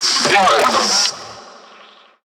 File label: Ranged Pain; Necramech; Battle Barks